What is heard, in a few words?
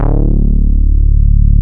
CL BASS; East West - Global Sounds; THE CAT